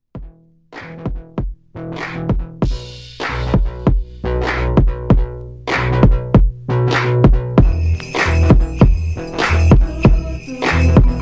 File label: instrumental